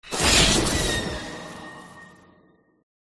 Dark magic spell cast hit

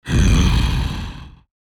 Werewolf angry grunt

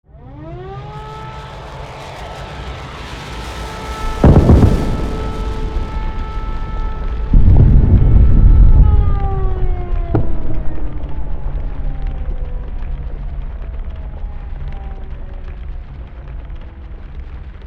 Nuclear explosion deep rumble massive bomb

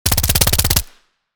Thompson submachine gun short burst; war

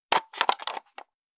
Cassette deck player portable remove tape

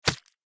Worm falling impact on surface; slug and worm

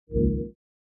HUD futuristic button confirm

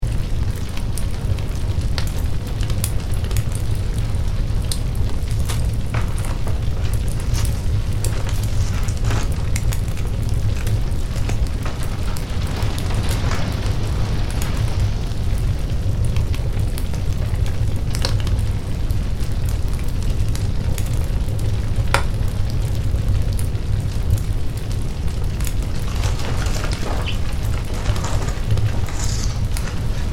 Burning house intense fire raging flames loop

